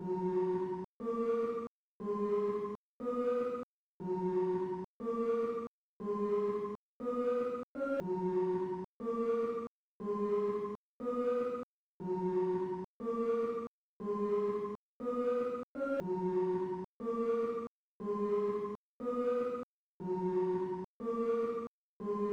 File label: synthy